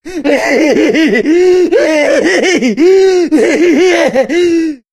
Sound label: jockey lurk; Left 4 Dead 2